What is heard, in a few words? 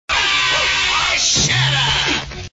scream and shut up stereo